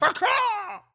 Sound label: cacaw; Animal Sounds; Various Animals; Short calls